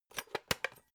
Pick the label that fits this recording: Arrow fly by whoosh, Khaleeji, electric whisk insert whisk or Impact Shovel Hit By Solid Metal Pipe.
electric whisk insert whisk